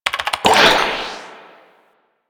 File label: Messenger Message Send; Ambience; Warframe; Nineteen Ninety Nine